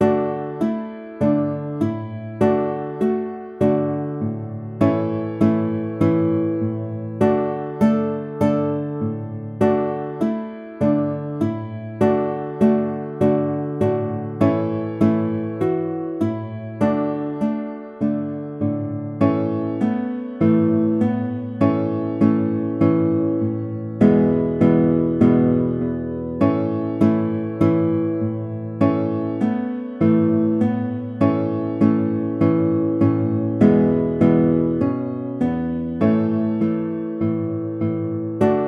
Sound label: Mary Had A Little Lamb Guitar